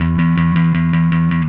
Happy Pub Bass

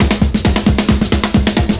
FILLS, 70_s Breakbeats

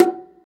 Bongo Lo Slp; Percussion

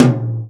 tom, Real Drum Kit, jungle kit

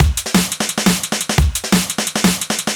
DNB BREAK

